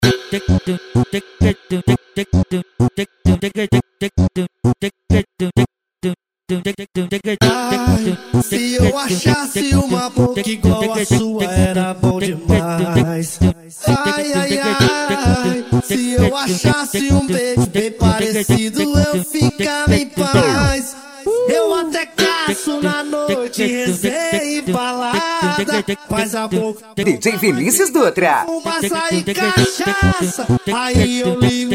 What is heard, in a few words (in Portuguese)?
funk consciente e hip hop